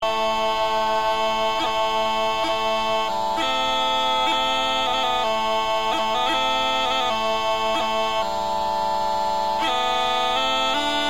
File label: weepipe melodie